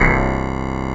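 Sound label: synth Morpheus; Sound & Vision - Gigapack II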